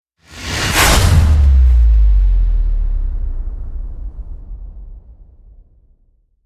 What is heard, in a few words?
Slider Hit Downer; Ninja Tracks